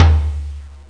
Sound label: TOM; Descent II